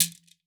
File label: CABASA; Xsample VOL-9